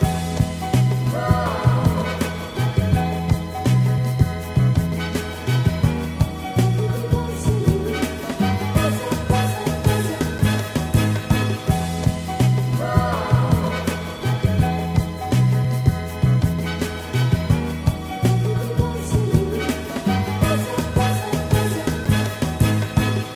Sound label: chorus sample